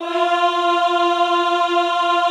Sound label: EHH F3, Classical Choir; Best Service